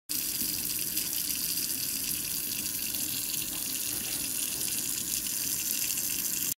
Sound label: faucet; شیر آب